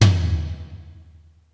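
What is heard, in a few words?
pop toms, drum kit; Giga Samples Collection; ELQ-DrumQit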